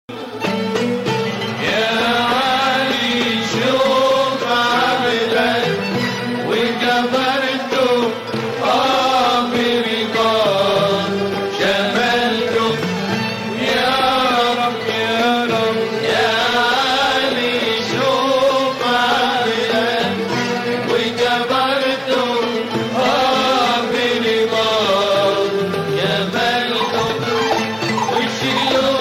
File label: country music